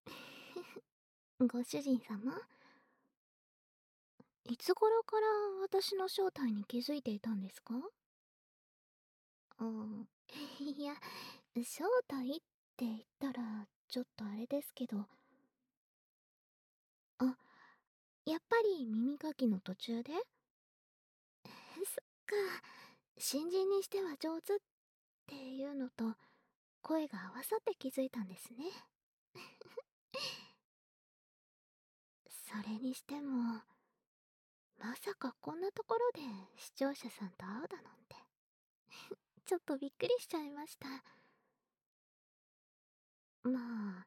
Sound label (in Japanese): 耳舐めマッサージ奉仕です, ASMR; 新人メイドの秘密のお〇んこ奉仕♪